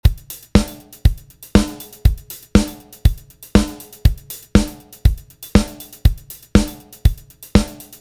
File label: drums good